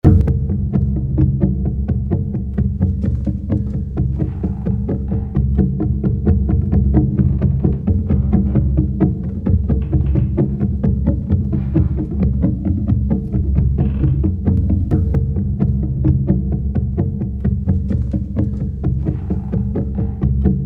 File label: Drums; Jumanji